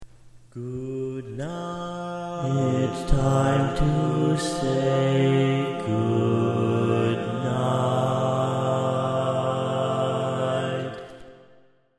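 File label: Baritone, barbershop tags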